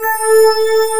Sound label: DIDI PAD, SYNTHESIZER; AKAI S6000 CD-ROM - Volume 1